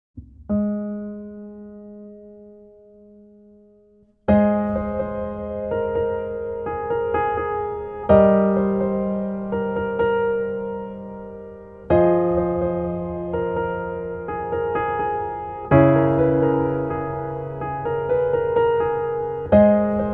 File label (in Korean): Piano ver 멜로디MR 여자키